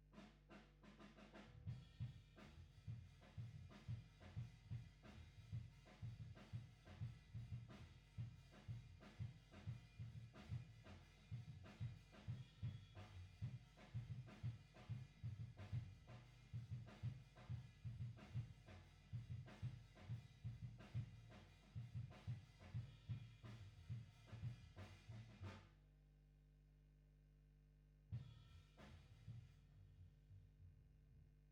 GTR B

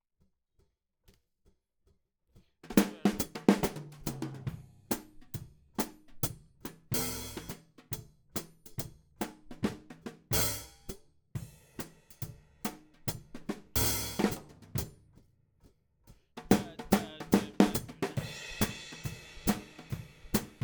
Hat

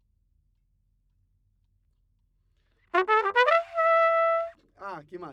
Trumpet

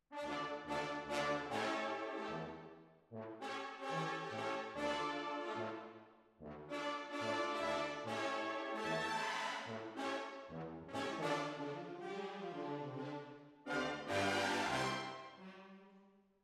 Hall

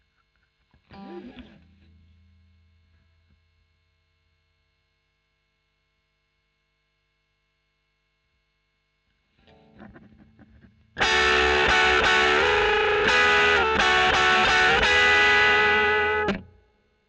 E. GTR Royer OD

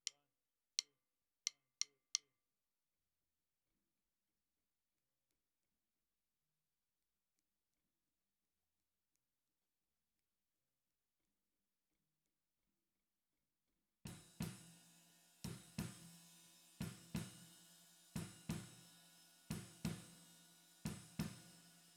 SNR

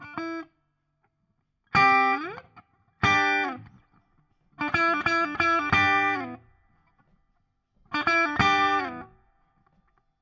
Guit Solo Back

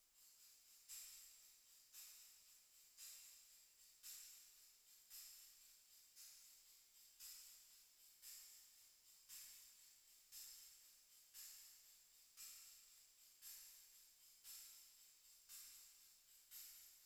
PCM 70 Tambo

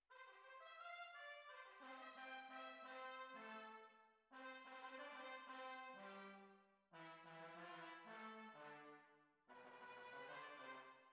Tuba, jazz for piano

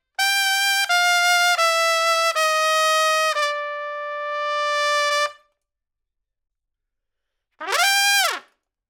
Trump

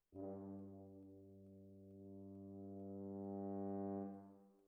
Sax

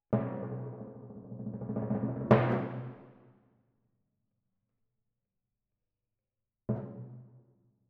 Twin F Timp

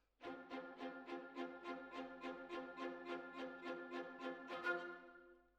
Violins Over

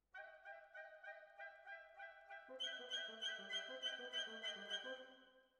Violins, jazz for piano

